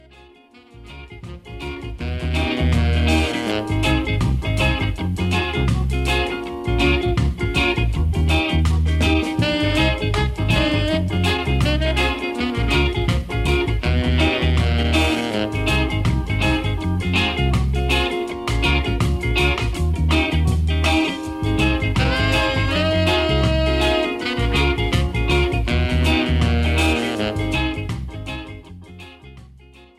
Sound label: Reggae